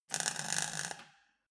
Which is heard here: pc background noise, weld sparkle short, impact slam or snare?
weld sparkle short